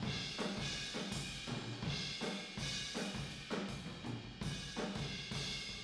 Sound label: Boogaloo Fill Room ST, 82 BPM, Drums; Sonic Foundry (Sony Creative Software) - Drums from the Big Room The Mixes